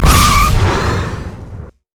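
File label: overdrive; piltover customs blitz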